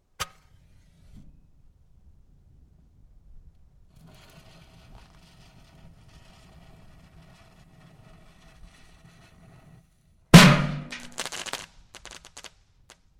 Fireworks Fountain Metal Can, Variety of fireworks being detonated